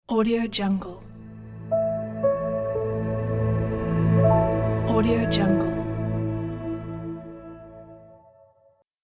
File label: elegant logo